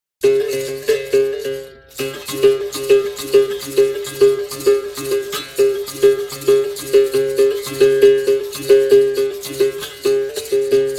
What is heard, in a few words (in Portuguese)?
Toque de Cavalaria